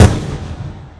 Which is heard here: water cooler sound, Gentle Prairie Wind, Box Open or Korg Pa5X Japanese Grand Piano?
Box Open